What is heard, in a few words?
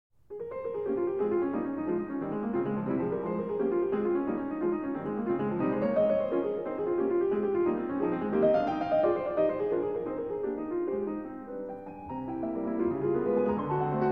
Impromptu in F Minor